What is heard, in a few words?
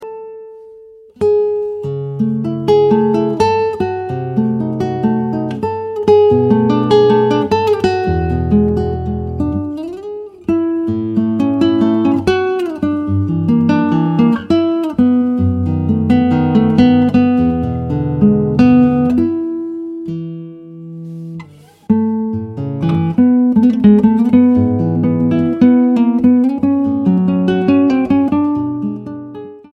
Inspiracao